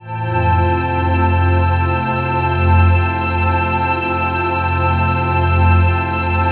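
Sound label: trance pad; Best Service; XX Large - Pads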